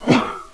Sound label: JUMP